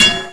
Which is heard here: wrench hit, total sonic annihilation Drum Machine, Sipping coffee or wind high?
wrench hit